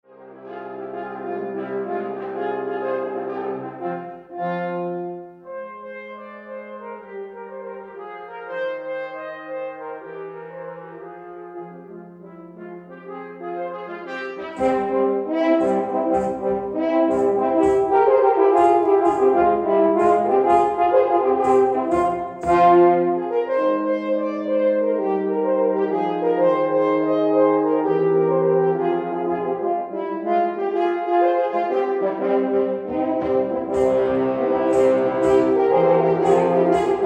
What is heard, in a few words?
Grandmothers Polska; Brodal